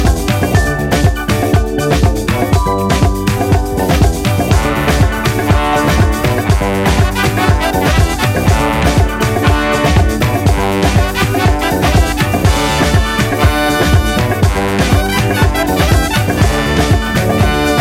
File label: Funky Disco Music